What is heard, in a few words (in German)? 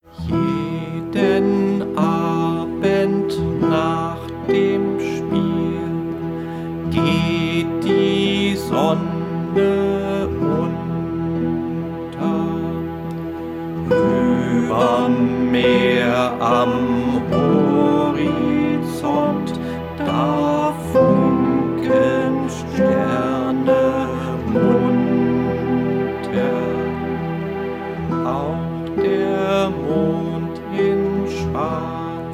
abendmelodie